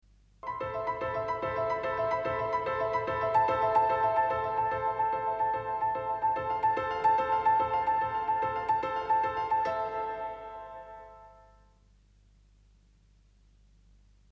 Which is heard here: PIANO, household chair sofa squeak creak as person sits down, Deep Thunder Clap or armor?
PIANO